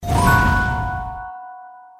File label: ruby Shine; slots; sRoma